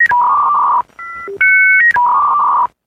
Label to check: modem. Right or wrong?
Right